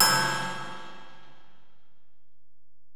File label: Ride, Alesis DM-PRO